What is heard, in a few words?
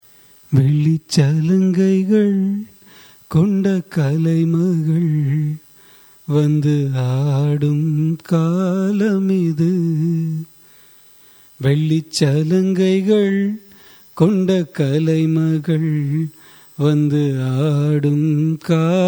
raga chandrakauns cine, carnatic music